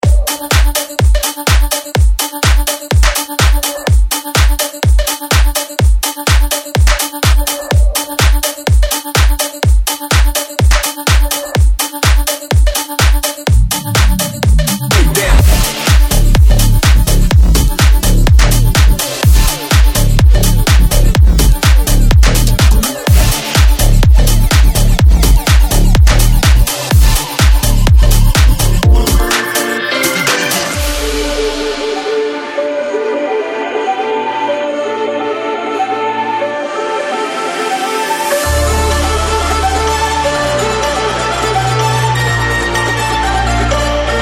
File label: bass symphony noise mashup